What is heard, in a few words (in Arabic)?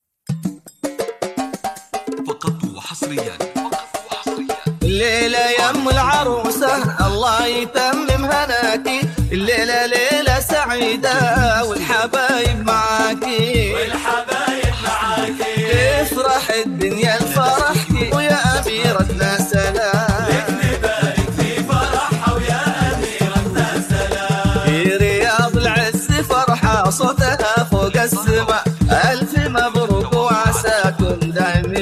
زفة دفوف بدون موسيقى زفة حماسية